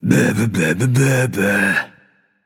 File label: nv tongue; smite; voicepack; hachiman